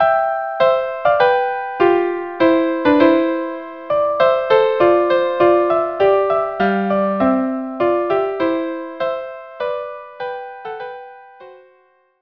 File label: violin duo; La Generosite